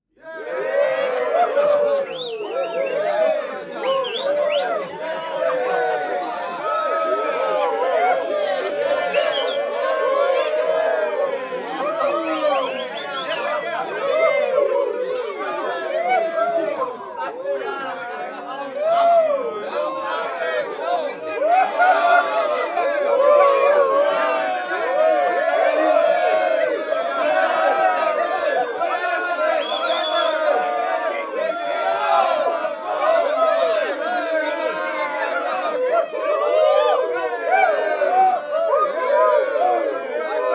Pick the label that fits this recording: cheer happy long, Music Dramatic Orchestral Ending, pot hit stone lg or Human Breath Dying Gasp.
cheer happy long